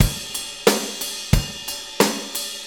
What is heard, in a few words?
90 ROCK, DRUMS